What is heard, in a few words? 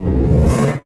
IMP FLAME, Cinematic Impact